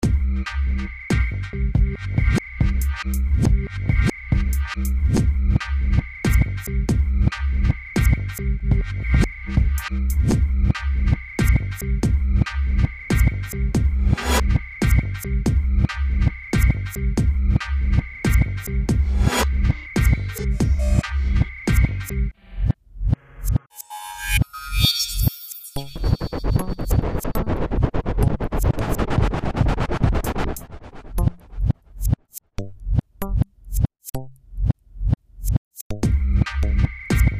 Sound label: Reverb